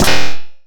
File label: Button